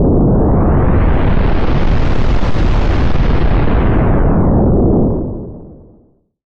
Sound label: kaboom